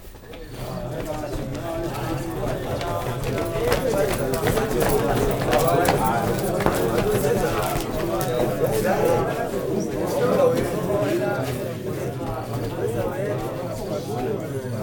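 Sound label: AFRICAN CROWD; Spectrasonics; Heart of Africa 1; AF.CROWDS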